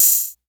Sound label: 808 OHH